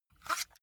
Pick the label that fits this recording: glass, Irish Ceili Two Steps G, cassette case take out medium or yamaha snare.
cassette case take out medium